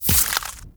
Arch Gun Primary Beam Impact Flesh; Warframe; Archwing; Hildryn

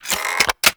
Xbow Reload Ready; Warframe; Tenno; Primary Xbow

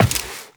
Step Default, Footsteps, Walk; Warframe; Tenno

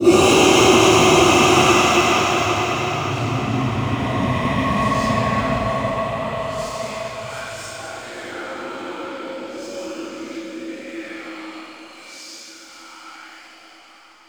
Reaper On Bridge Raise; Wraith Quest

